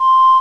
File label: Ocarina (C5); X-Wing Demo